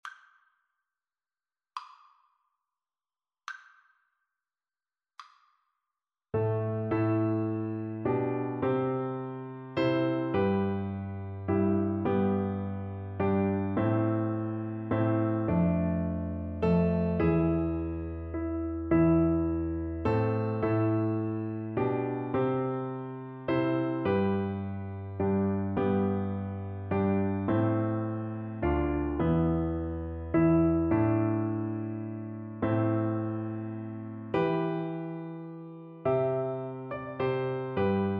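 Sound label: recorder karaoke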